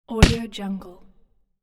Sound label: gun shot with silencer; game sounds